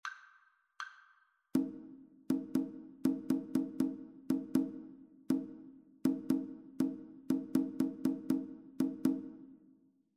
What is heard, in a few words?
rhythmic dictation; ear training